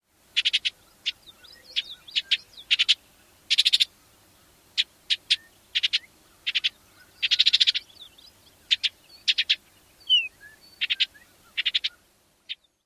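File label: Orchard Oriole, chit calls and whistle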